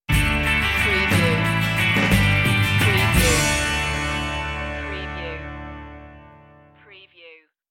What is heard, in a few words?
the christmas bells stinger